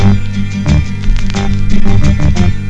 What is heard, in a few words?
guitar; loops; Phonky